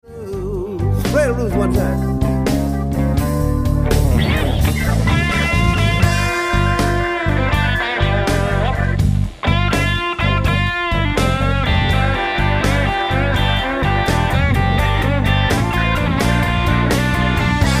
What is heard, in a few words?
solo downhome